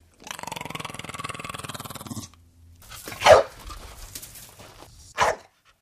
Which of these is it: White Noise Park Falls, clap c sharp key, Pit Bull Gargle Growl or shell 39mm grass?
Pit Bull Gargle Growl